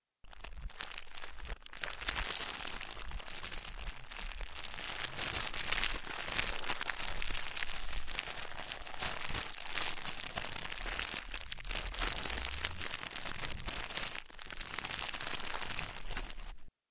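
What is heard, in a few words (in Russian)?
шуршание пластика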